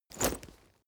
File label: leather metal impact soft